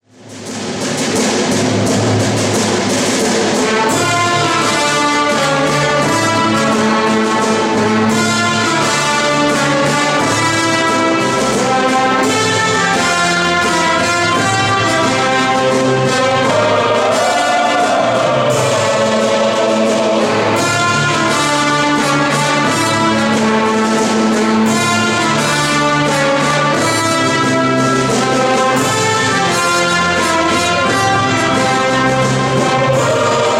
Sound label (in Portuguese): CORAL E ORQUESTRA